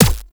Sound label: Medic Drone Shield Hit Notification; Abilities; Warframe; Tau; Dax Medic